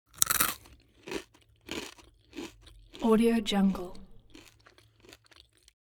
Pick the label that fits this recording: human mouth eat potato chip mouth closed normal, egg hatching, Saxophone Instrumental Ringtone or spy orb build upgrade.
human mouth eat potato chip mouth closed normal